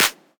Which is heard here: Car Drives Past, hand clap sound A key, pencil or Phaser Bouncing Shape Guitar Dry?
hand clap sound A key